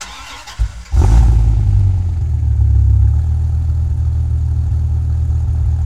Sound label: Viper GTS Start Loop; Automobiles